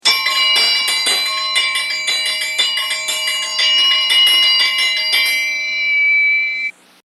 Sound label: Antique Electric School Bell; Bell Sound Effects; Bells (Sound Effects:Musical)